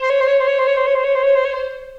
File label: Piccolo Solo Half Step Trills; Ableton; Orchestral Woodwinds